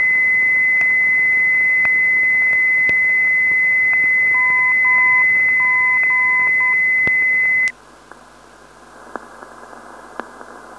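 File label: mg 296khz, non-directional beacon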